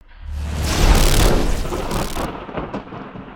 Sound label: maelstrom strike; creatures organic